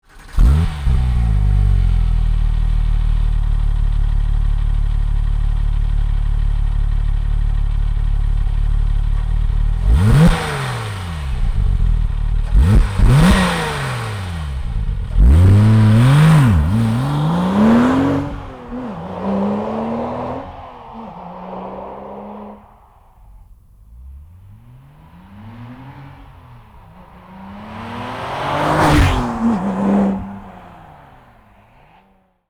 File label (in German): motorensounds, 997